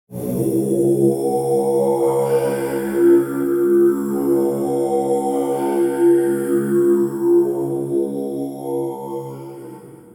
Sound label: Tibetan Chant; Djgriffin; Colargol